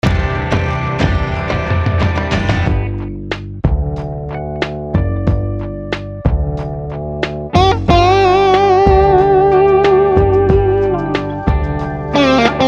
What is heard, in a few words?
Gibson Burstbucker